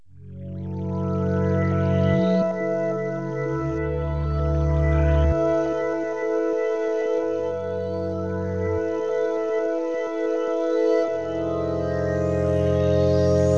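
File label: goblin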